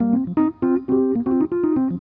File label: E. Piano